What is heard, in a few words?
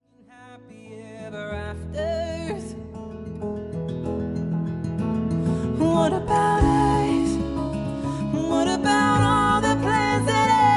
Acoustic